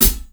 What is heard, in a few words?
clean hihat g key; drum single hits one shots